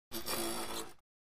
Biking Brake Pads Ag

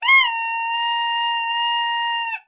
Horns 2, Various Party / Bike Horns, Long.

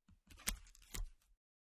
pager holster

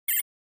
Flash Beep HF Elect To, flash beep

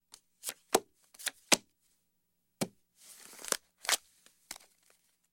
Cards Dealt Cards Dea, casino games cards

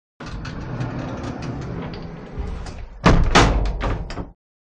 Elevator Door Open, metal gate

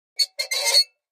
Metal Scrape On Metal

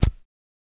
Zippo Lighter Flint; lighters & matches

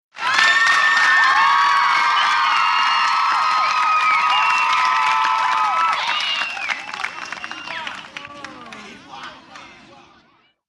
Young Kids Cheer Yell

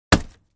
Axe Paneling 2 Side Du, axe hits

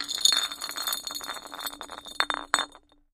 Glass Bottle Roll On; bottles

